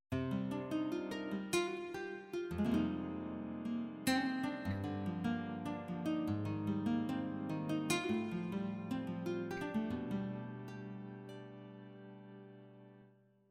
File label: Roland Fantom XR Guitar